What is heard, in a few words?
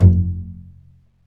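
strings, contrabasses pizz; Roland L-CD702; Cbs FX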